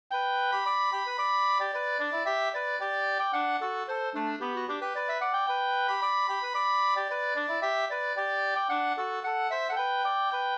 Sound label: polka; e notbok fr Go 2st